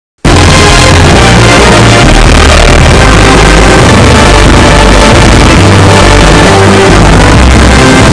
explosion; kirby; nova

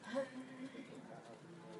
cough

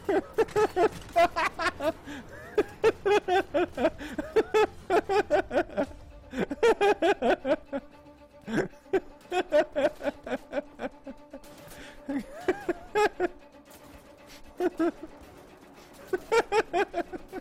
hehe

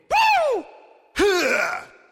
woo ugh

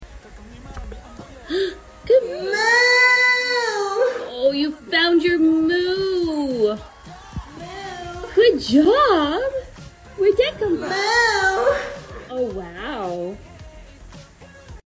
moooooew

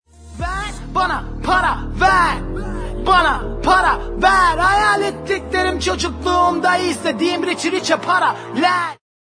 ice cream song

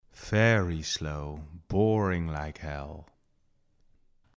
very slow

